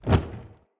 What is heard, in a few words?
Wood Stool Impact Light